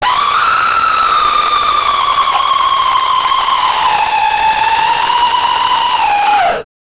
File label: distorted screaming